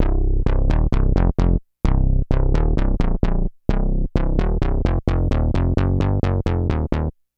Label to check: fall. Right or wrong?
Wrong